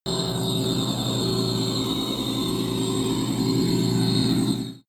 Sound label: magic warp relocate building damaged